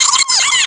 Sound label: ChuChu Stun